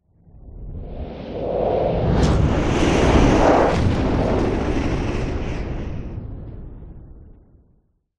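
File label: sound design cinematic whoosh wind rush into boom aggressive powerful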